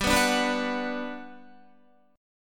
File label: G Major 7th, G, down, Guitar, GM7 chord; strummed; JGuitar